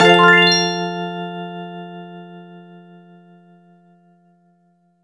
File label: post sort perfect hit low; horace ps4 switch windows xbox one gamerip 2019